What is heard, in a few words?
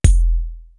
tam kick; East West; Global Sounds